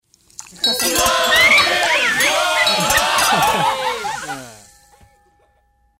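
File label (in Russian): fujeri smeh, novyi god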